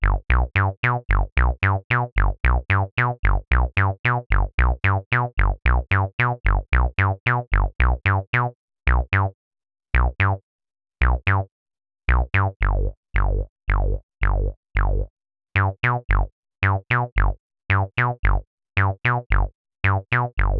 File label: Frog; AN200 sample pack